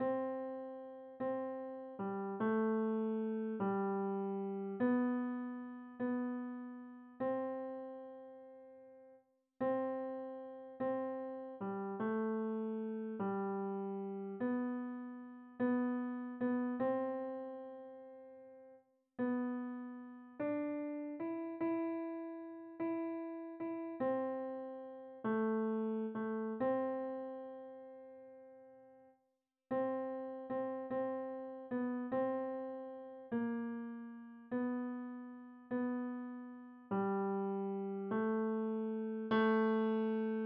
SATB 4 parts on Piano

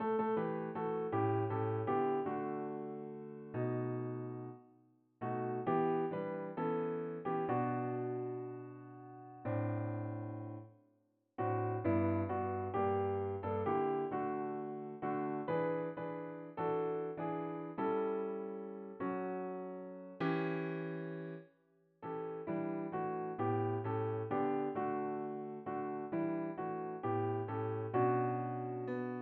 SATB Soprano Predominant

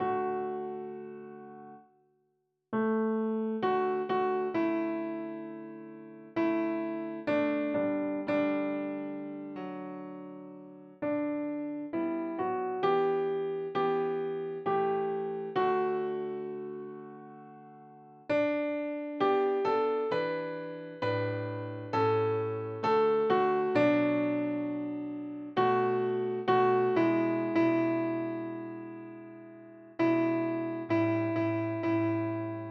Piano Soprano Predominant

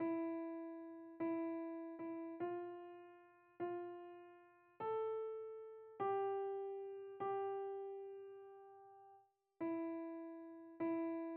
SATB 4 parts on Piano no Ah Soprano

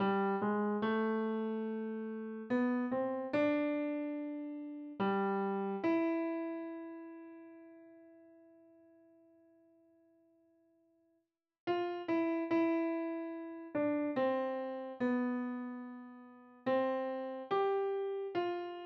SATB piano Tenor; Tenor Mel.

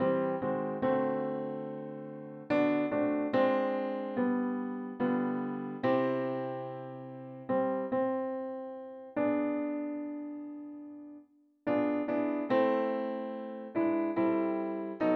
SATB Alto predominant

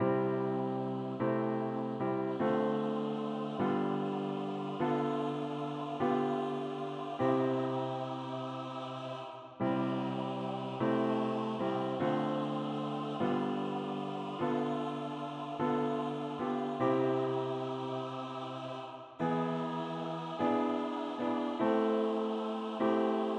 SATB Ah w Piano

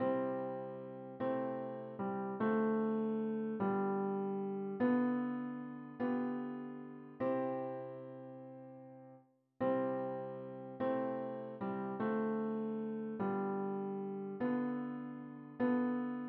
Alto Predominant

